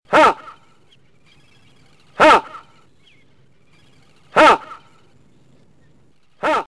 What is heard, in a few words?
Little Egret, songs